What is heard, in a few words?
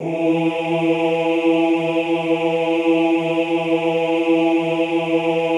OOH E2, Classical Choir; Best Service; Extended Classical Choir; OOH MIX